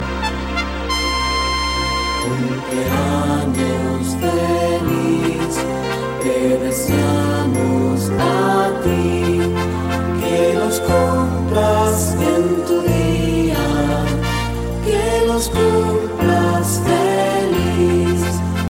feliz cumpleanos castellano tono de llamada

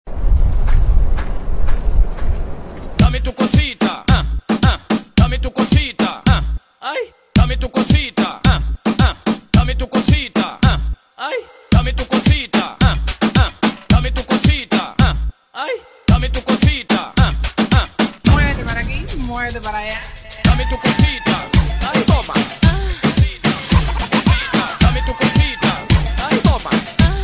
castellano tono de llamada

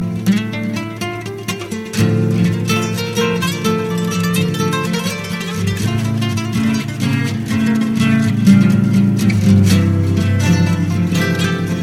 romantico castellano tono de llamada

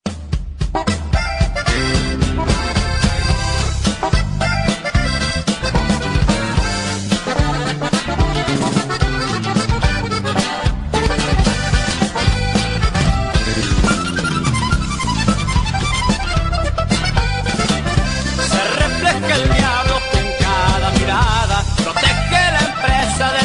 corrido nuevo

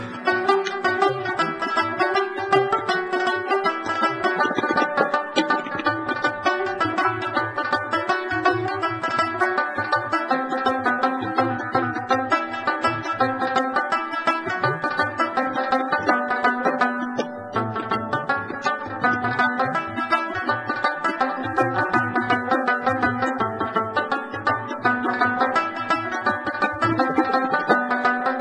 musica afgana tono de llamada; castellano

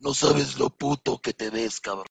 notificacion tono de llamada

